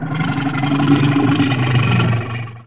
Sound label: moan; The Elder Scrolls III - Morrowind